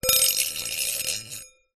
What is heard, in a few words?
foley metal small dish drag scrape concrete